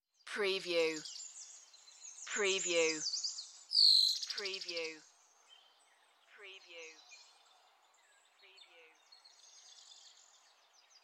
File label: Nature Birds